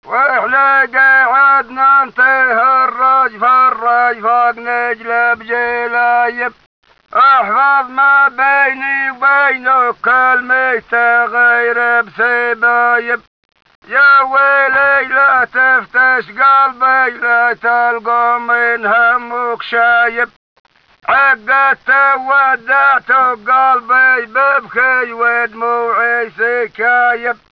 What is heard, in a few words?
camel